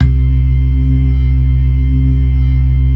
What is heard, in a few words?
LO MAN V R, ORGAN LOWER MANUAL